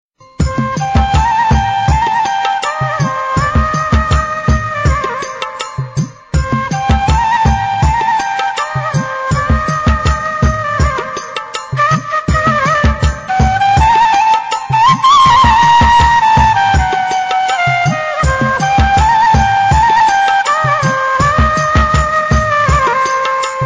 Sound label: Classic Hero Flute